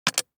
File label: DICE on Glass, Drop, Standard, Six Dice; Samples; ArticulatedSounds; DICE Sfx Party (Extended)